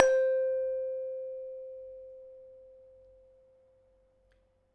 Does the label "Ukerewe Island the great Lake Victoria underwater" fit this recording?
No